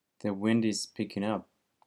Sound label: wind picking